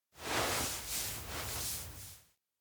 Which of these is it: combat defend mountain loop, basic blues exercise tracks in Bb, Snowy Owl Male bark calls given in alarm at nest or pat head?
pat head